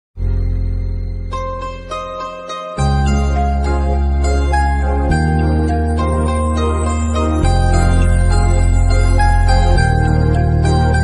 new ringtone ringtone hindi ringtone caller tune romantic ringtone ringtone